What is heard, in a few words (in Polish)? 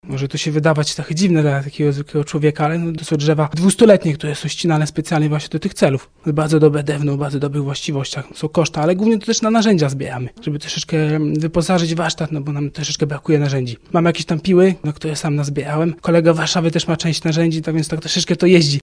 klawikord